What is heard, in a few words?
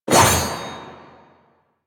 Sword Whoosh; Warframe; Teshin; Weapons